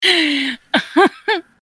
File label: laugh